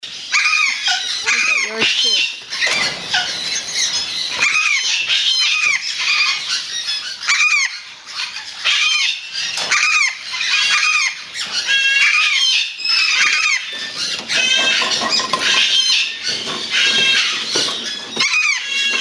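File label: Major Mitchells Cockatoo, parrot calls; Leadbetter's Cockatoo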